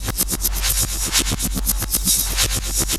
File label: Time Travel Beat, Electronic Beats; Sonic Foundry (Sony Creative Software); Myths of Technology